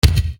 Bass Dr, EuroDance Bdrums

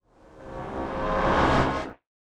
Reverse Fx; musicradar

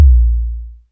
m base, Jomox Mbase01

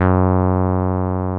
TB-303

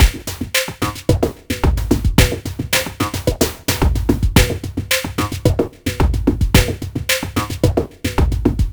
trance beat in C; Sonic Foundry (Sony Creative Software); Ugly Remnants VOL-2

